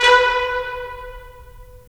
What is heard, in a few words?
BRS, Roland - LA Composer; Roland LA Composer vol. 4